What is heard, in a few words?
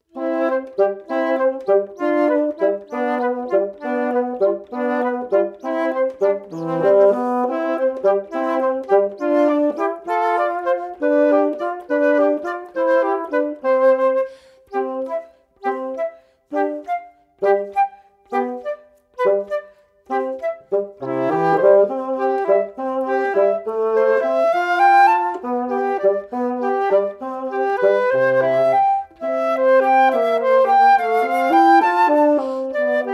instrumental; les epinards